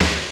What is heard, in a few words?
hard drums; 90 sSampleCDs; Crypton - DrumBase5000